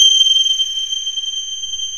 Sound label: SYNTH CARILN